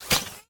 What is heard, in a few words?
zombie step; smite; ahpuch skin10